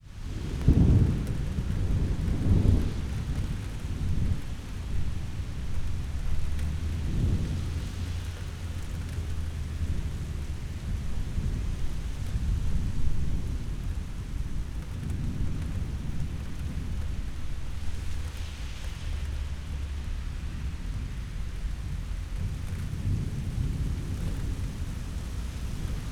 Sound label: Rain And Thunder